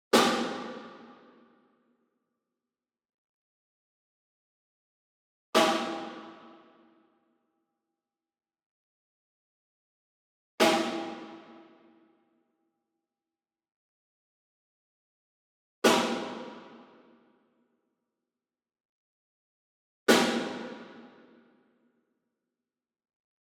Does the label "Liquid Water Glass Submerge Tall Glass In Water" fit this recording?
No